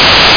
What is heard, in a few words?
BALL TO PLUNGER